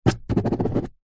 office pen ball point write signature paper on plastic table